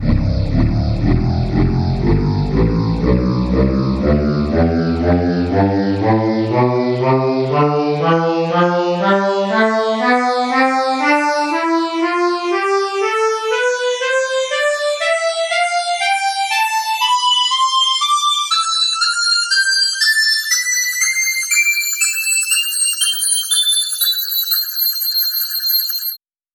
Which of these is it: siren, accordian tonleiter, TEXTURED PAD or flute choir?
accordian tonleiter